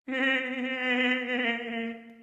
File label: giggle laugh